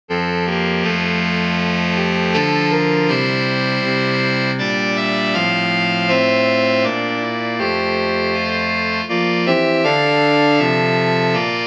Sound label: Carol Sax